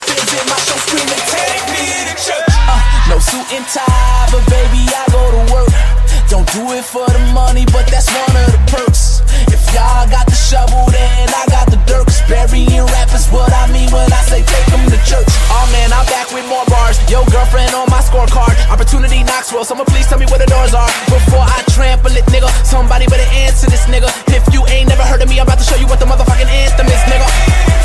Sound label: rap ringtone